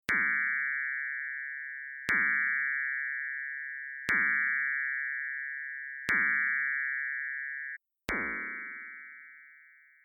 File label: Materials Pulse Beech Birch Oat Rice; biomaterials